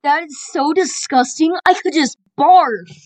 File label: barf